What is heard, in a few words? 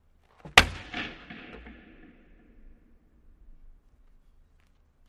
Hockey Player Stick